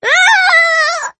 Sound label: screaming